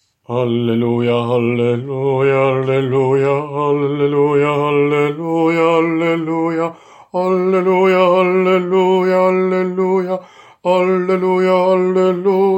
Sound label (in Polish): Alleluja ALT